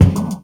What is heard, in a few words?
Spitter Kick, Esoterik Beatz; Spitter Kit